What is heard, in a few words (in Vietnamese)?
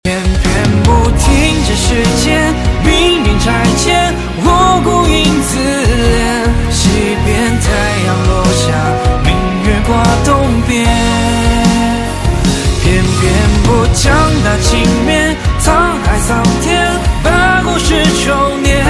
Gui Trang Sang tieng Trung